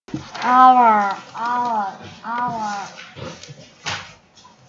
owl

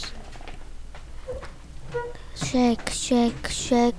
shake

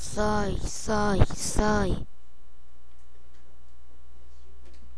sigh

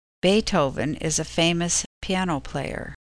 piano

